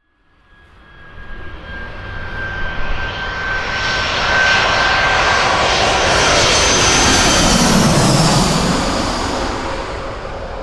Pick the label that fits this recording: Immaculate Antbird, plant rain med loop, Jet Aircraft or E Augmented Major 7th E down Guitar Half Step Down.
Jet Aircraft